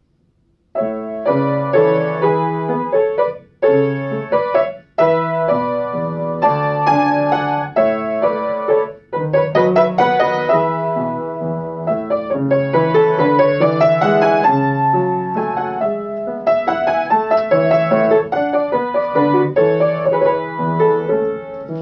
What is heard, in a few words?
Minuet in A, piano solo